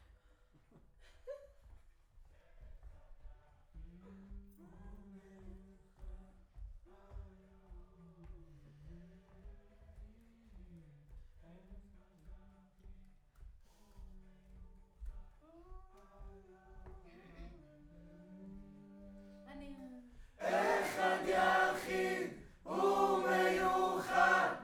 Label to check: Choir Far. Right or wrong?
Right